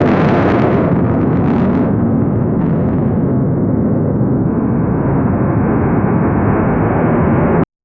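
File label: firing a fighter jet flares; alert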